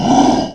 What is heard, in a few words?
bump; Alien Arena; xenoid